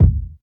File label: original kick single shot D sharp key; kick drum samples